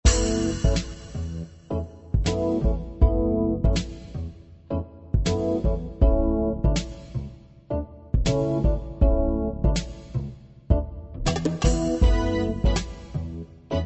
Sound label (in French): groove du matin